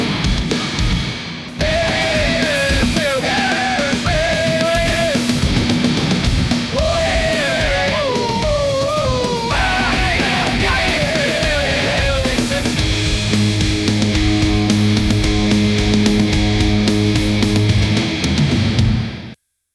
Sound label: 2000s wrestler entry song; wrestling